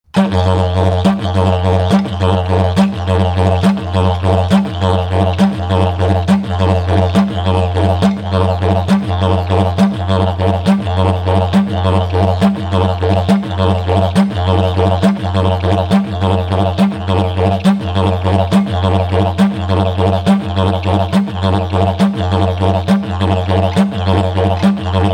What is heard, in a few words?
Dup Pu Dhirrl Dhirrl Fast